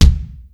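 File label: bass drum rock; 300 Drum Machines; Yamaha Motif ES-Series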